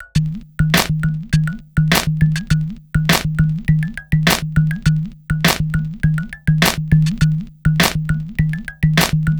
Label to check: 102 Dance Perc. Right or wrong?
Right